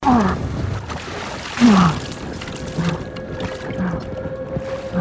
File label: panting